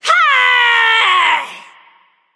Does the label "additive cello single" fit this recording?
No